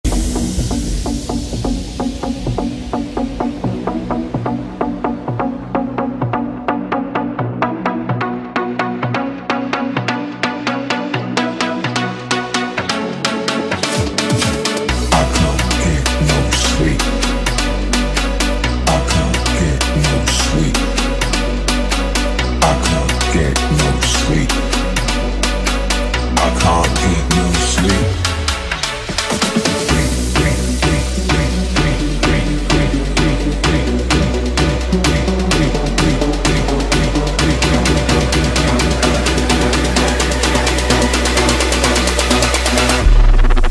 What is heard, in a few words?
dance bass music